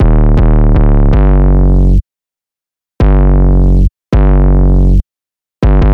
Bass 808s Aggressive After

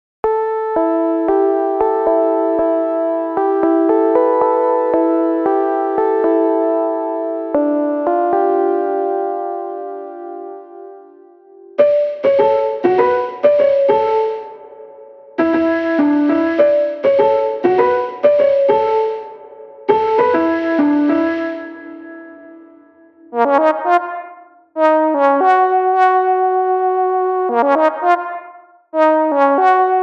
Melodies Neo Soul

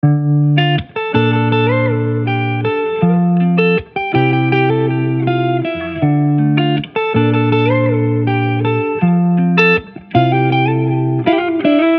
160 BPM Dm

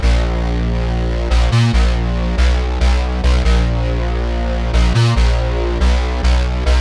Trap

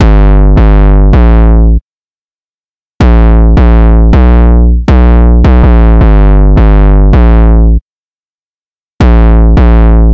160 BPM Gm

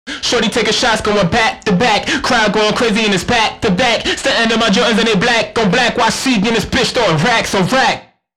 Vocals Dirty

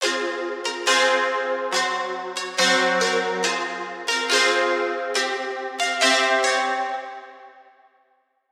Chords Rhythm Ethnic; midi wizard 2; Unison

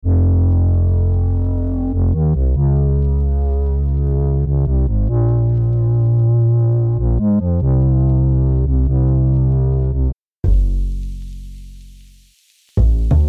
Lo Fi

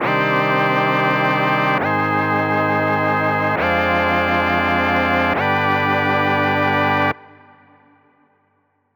Chords Full Hip Hop; midi wizard

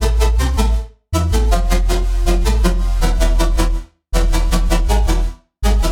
Future Bass Melo Trap

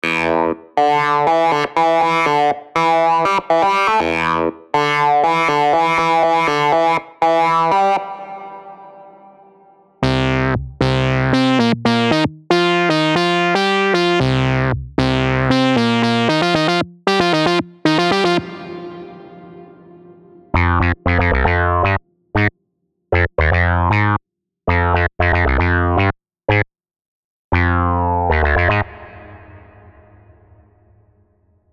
Melodies Funk

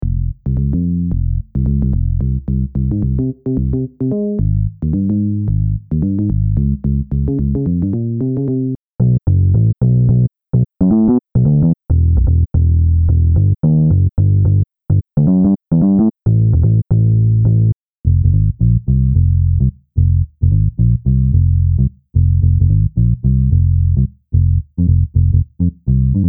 Gospel

